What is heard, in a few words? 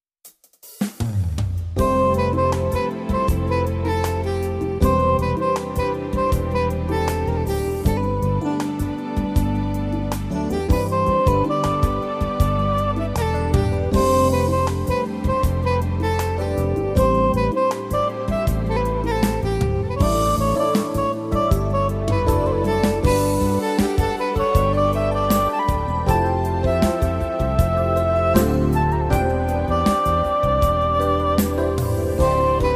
Sop Sax